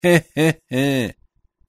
risa forzada Forced laugh

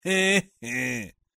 risa incredula incredulous laugh

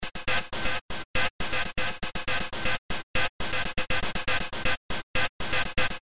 rhythm loop RassTech

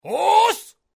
ooos voice sound effect karate figth manga; free videogame sound; Sound Graph

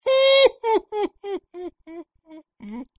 risa traidora laugh treacherous

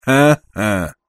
risa incredula incredulous laugh

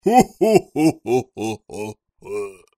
risa compromiso Laugh commitment

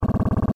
Electronic system sistema electronico; Sound Graph

